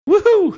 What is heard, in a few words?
woohoo